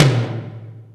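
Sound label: Lo Media, Alesis D4 drum machine tom; 300 Drum Machines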